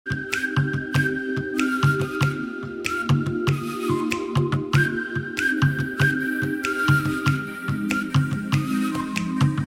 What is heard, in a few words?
ordinary whistle; classical